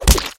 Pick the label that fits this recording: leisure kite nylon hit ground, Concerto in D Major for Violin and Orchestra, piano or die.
die